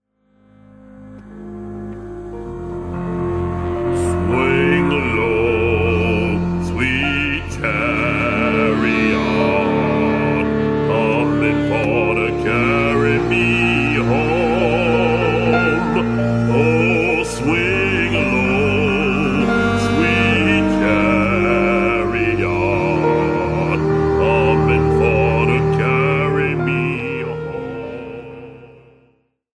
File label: swing